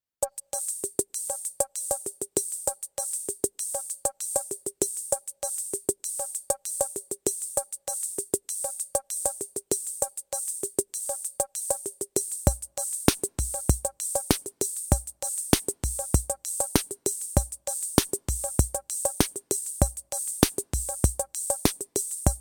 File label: Roland CR-78 Samba plus Rock